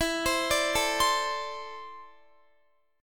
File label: E 7th Suspended 4th Sharp 5th E down Guitar 4E 4A 5D 5G 5C 6F; arpeggiated